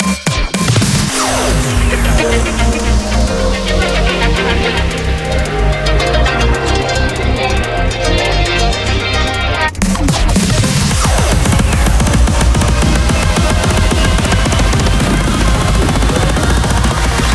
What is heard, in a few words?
220Step Instrumental; 220Step Instrumental Edit